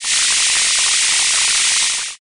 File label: shower